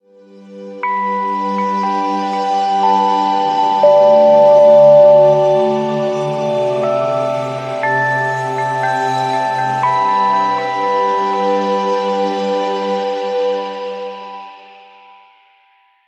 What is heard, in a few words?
weather alarm haze; OnePlus; ui; OnePlus 11